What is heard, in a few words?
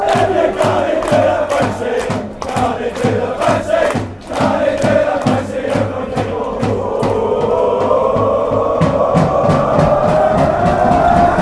bouncy, fanchants